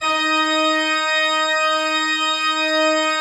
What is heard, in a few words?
D4, Cathedral Organ; ILIO; Propeller Islands Cathedral Organ